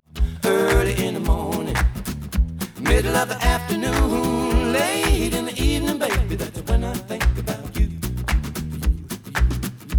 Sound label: Stereo output noised; 4bit